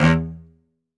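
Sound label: solo cello, strings; ILIO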